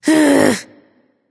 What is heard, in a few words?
grumble; smite; artemis